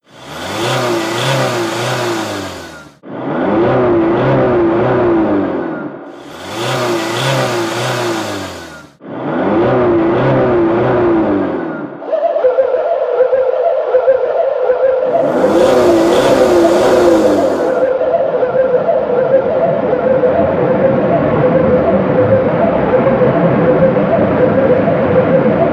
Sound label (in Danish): storbyens liv